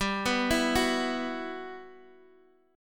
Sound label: B Suspended 4th G, chord; arpeggiated; Bsus4; JGuitar